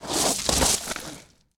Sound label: Bodyfall Gravel; SFX Kit; Bodyfalls